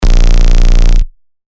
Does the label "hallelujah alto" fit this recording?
No